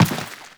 Step Dirt, Footsteps; Warframe; Teshin

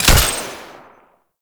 Rifle Projectile Launch; Warframe; Weapons; Tenno; Sundial

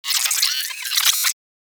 space drone pain; Warframe; Corpus Space Drone

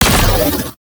Ice Weapon Beam Fire; Warframe; Corpus; Corpus Railjack; Spec Ops Ice Moa

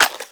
Shotgun Skin Reload Handle Foley; Warframe; Weapons; Zoboz Shotgun Skin

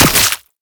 Brood Spider Attack; Warframe; Oraxia

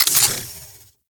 Modular Secondary Clip Mech; Warframe; Weapons; Modular Guns; Clips

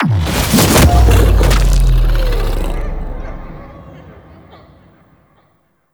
Finisher One End Success; Warframe; The New War; Archon; Amar; Finishers

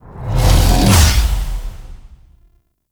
Hardened Wellspring Cast; Warframe; Focus; Zenurik

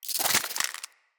Root Spider Foley Spin; Animals

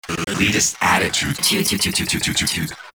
Decoy Bark; Infested Lich; DJ Rom

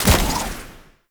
Spider Wire Impact Whoosh

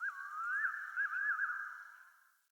Day Distant Bird

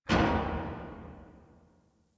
Parking Lot Metal Door Distant

